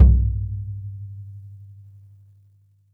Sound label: kick - snares off; JazzFunkKit